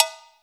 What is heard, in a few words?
COWBELL; AKAI S6000 CD-ROM - Volume 5